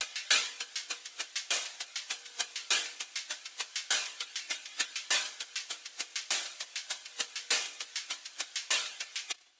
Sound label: Low Tide 100 Noise Rhythm, Ambient Piano Loops; C and Dm Night Mood